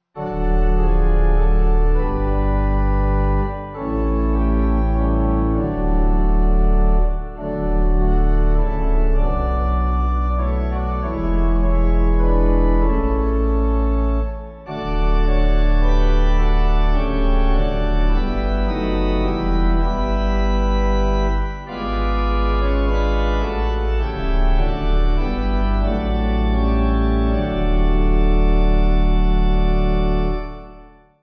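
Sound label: Pipe, Pipe Organ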